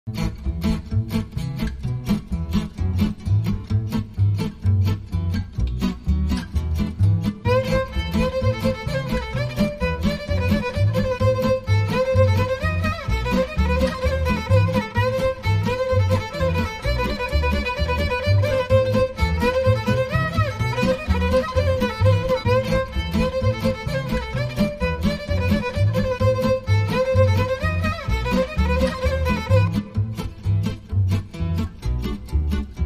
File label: Tzigane Mood